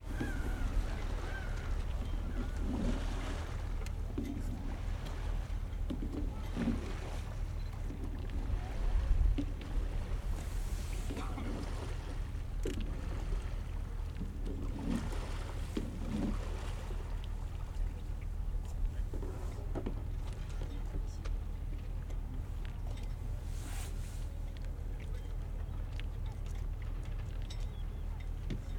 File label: Port